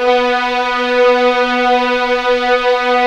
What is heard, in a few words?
B3 EXP MF, VIOLINS; East West; The Ultimate String Collection